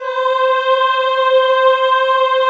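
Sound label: Choir C4, Ehh; Eeh + Ehh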